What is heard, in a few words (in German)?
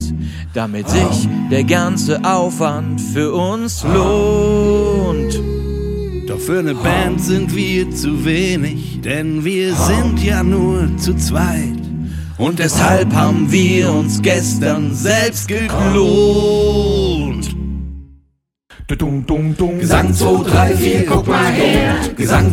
Gesang